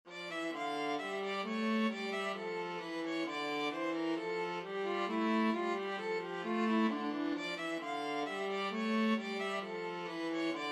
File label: bourree, violin viola duet